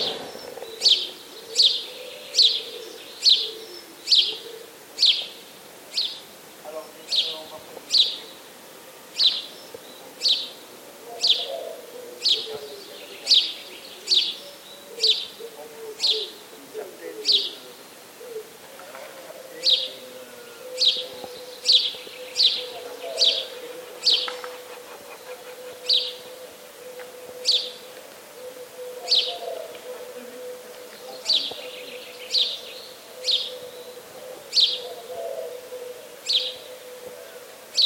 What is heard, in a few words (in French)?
moineau domestique tourterelle